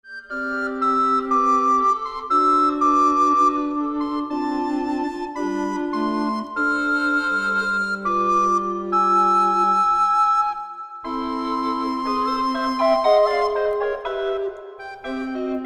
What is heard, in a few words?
Recorder Ensemble